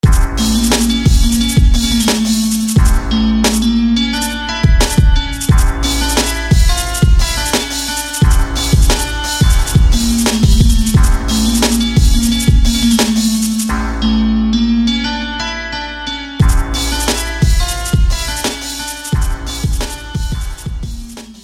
Ethno Harped

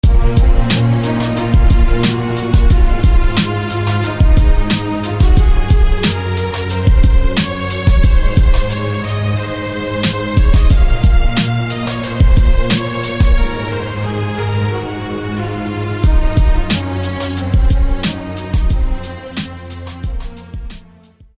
Amazing Strings; SFB FX

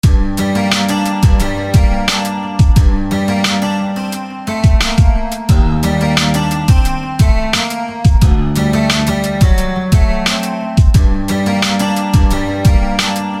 Calm Hollow Guitar

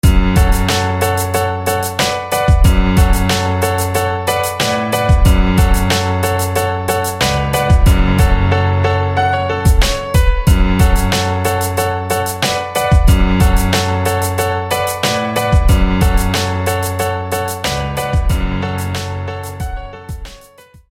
Grande Piano; SFB FX

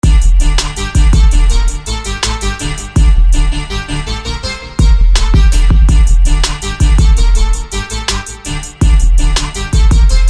Digital Punch

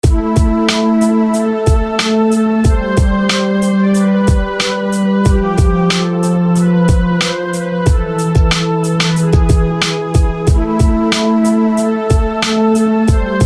Flute; Whitegold; SFB FX

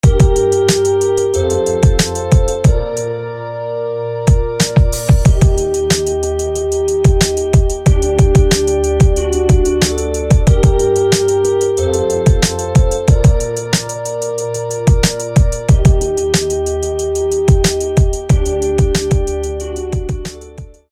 Mourning Organ; SFB FX

